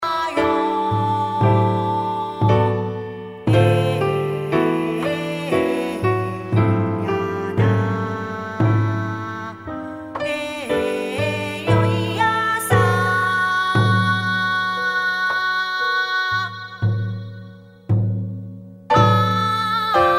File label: oriental mood